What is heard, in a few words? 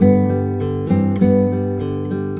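SPANISH G, acoustic guitar 100 BPM; A.GUIT100BPM; Best Service; World Colours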